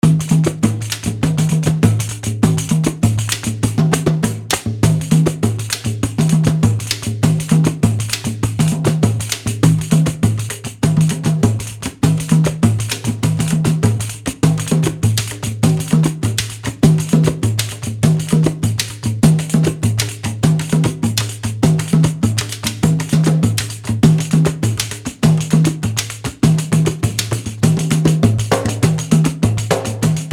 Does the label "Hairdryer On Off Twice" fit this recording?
No